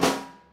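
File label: knock; Pro-Rec - Synthsonic; HIP HOP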